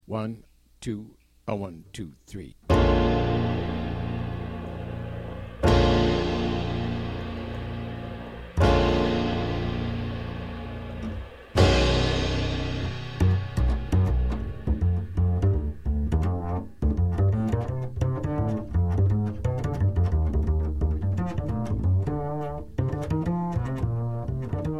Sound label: minus melody